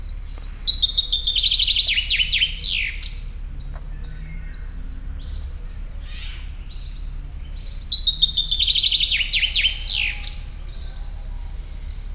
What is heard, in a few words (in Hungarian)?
a hím éneke, erdei pinty; szegedi zoo 2011